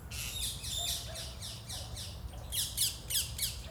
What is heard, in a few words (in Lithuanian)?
Kramerio Papuga